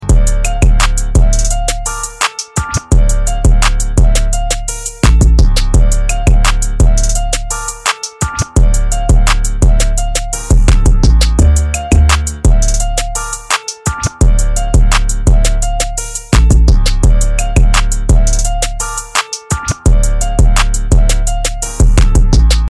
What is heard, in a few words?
instrumental ringtone